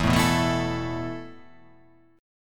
E Suspended 4th E, down

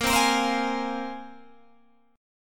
Bb 7th Suspended 2nd Bb Guitar Open G, chord

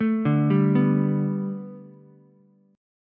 B Minor 7th Sharp 5th B Ukulele, chord; Bm7#5; arpeggiated; JGuitar

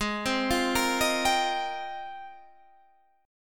F Minor 11th, guitar chord

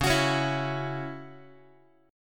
C sharp Minor Double Flat 5th C sharp Guitar; C#mbb5; strummed; JGuitar

